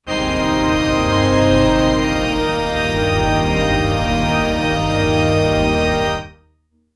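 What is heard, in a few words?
orchestra plus organ error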